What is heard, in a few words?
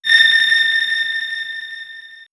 Swirls, pads; Goldmine4; X-Static Goldmine